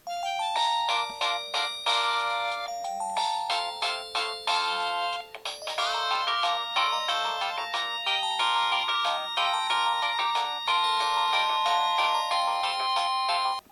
samsung sghe700 ringtone

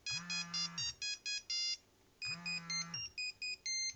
nokia 5510 ringtones playground

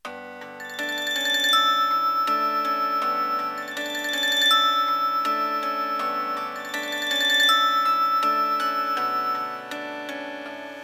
nokia1208 airy ringtone

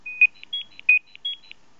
nokia3510i chase ringtone

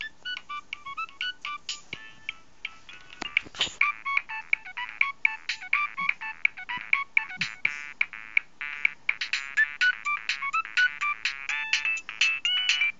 nokia3510i upswing ringtone

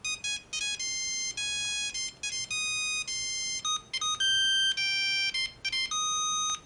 nokia 3210 valkyrie ringtone

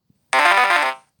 Chimes low

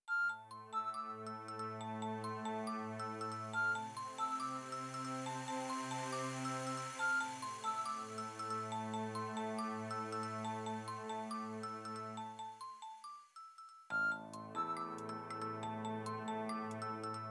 nokia 2600 bloom ringtone

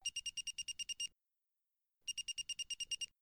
motorola startac ring style ringtone

